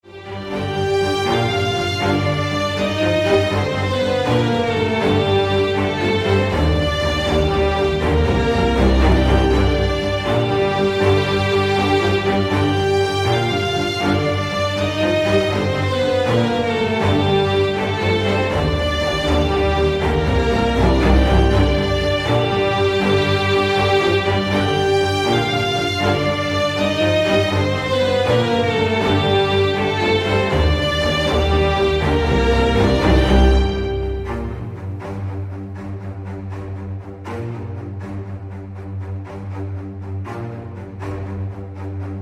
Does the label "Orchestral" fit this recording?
Yes